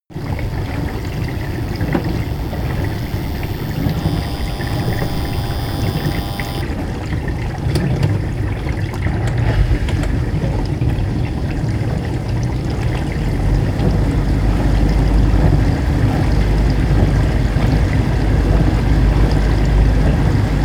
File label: dishwasher